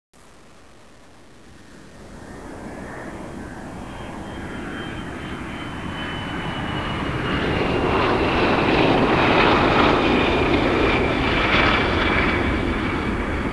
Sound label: ambient plane; chaoscity